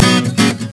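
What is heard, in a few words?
guitar string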